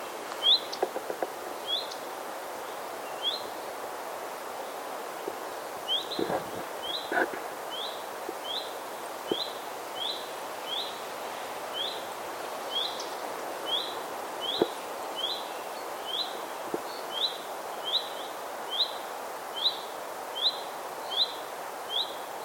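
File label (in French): rougequeue front blanc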